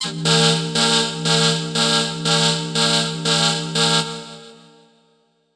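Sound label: Reverb Proc, alarm siren samples, 120bpm; musicradar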